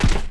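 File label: droid footstep dirt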